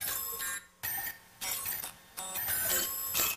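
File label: Background Beeps Noise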